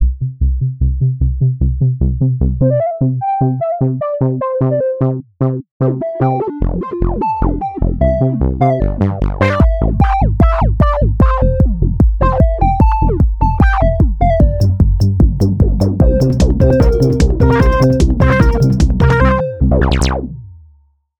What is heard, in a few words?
flower child filter